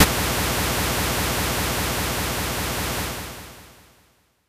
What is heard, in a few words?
noise